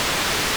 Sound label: static; net-gunner